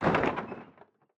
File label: Maze Retro Game Drawbridge Slam